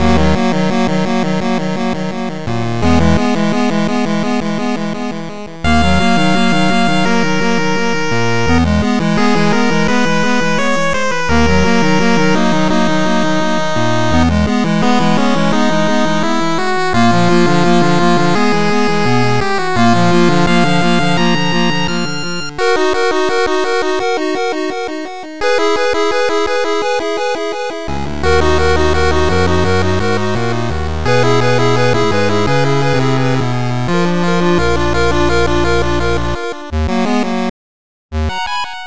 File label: 8bit; chikadou